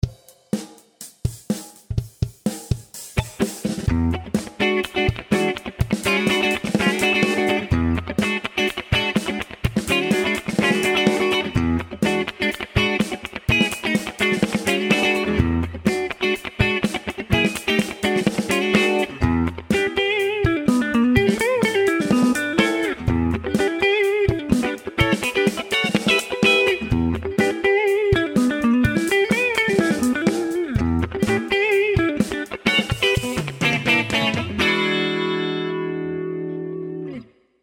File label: Clean funky mid